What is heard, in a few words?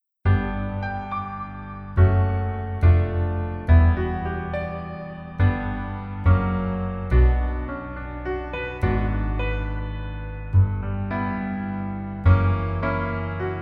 Piano ver